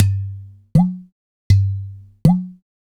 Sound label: Udu